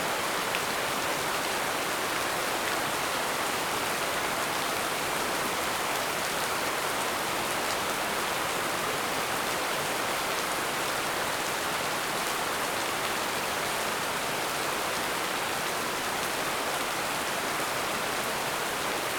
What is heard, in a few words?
rain; rainmaker